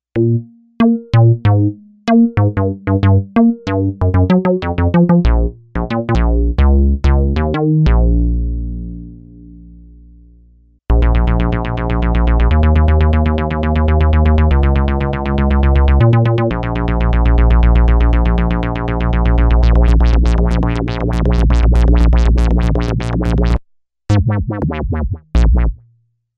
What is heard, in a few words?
EX BASS